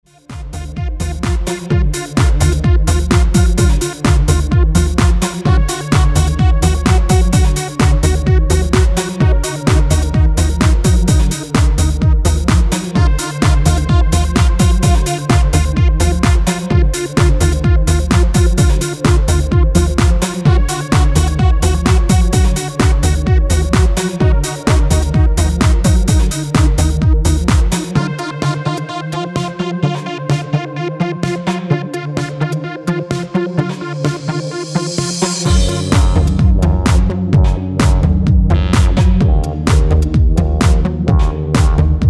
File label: Hypnotical beat